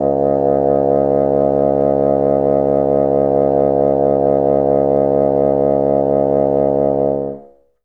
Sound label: C#1, Bassoon; Best Service ProSamples vol.51; Classic Orchestra 2